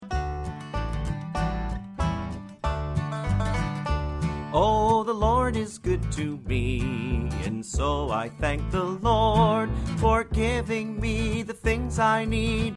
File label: johnny appleseed lord vocal; folk